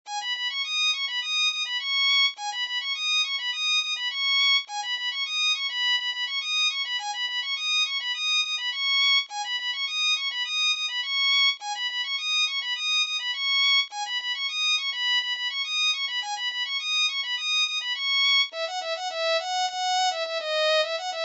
Violin

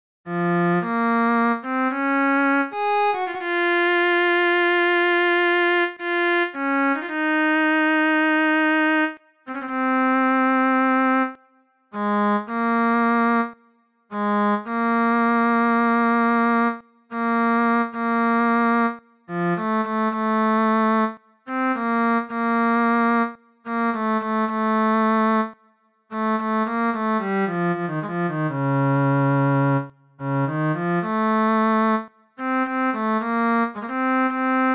Cello

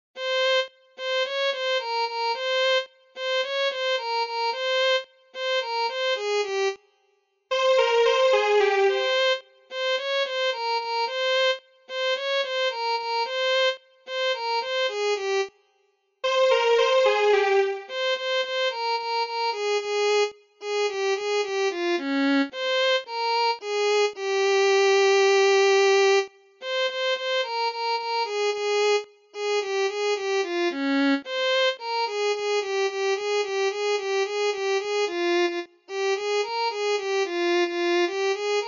Violin

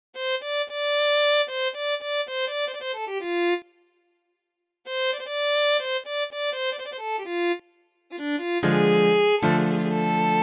Piano

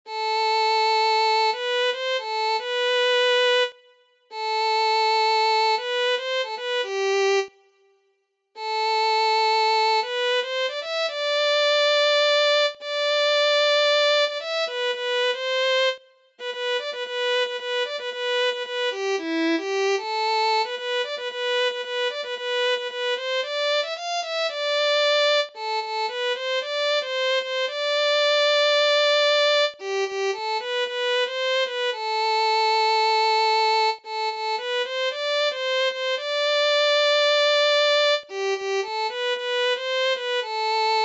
Violin

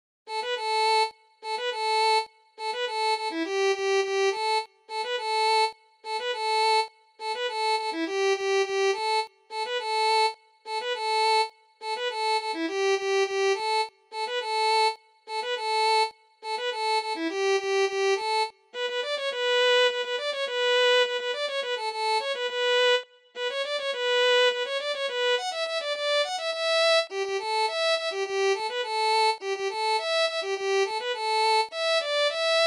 Violin

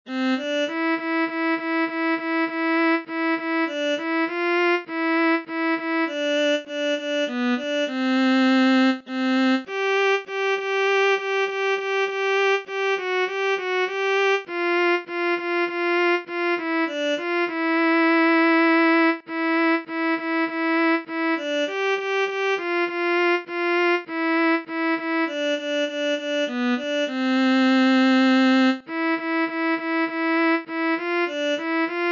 Indian National Anthem Viola

